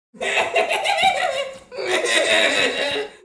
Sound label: laugh; "Hehehe...hahaha!"